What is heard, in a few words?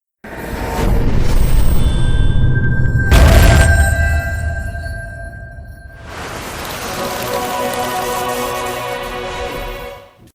saber